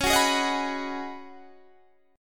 C sharp 7th Suspended 2nd Suspended 4th C sharp down Guitar Standard; C#7sus2sus4; strummed; JGuitar